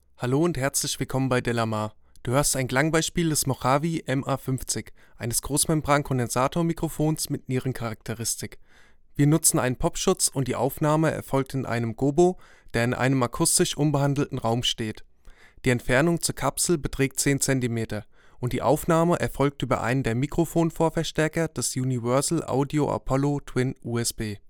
MA-50 Vocal 10 cm

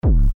kick master more control

